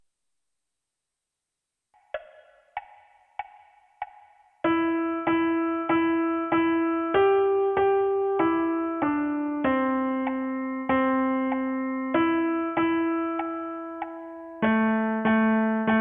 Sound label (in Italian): piano unite